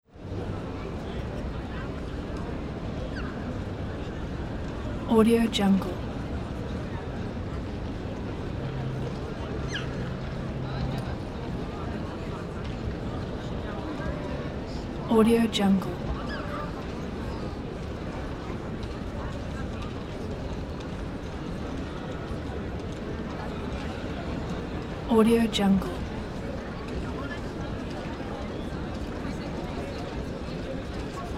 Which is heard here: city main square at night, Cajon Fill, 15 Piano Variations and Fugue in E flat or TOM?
city main square at night